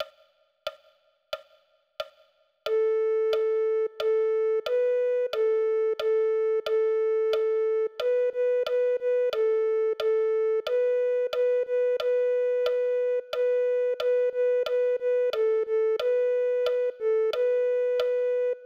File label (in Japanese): リコーダー, わらべうた